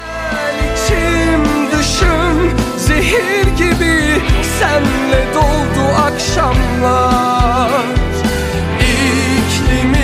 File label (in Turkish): turk zil sesi